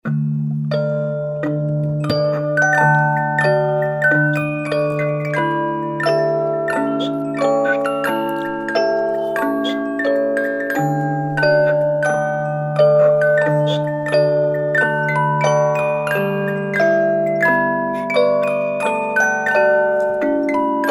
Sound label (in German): Kleine Walzenspieldose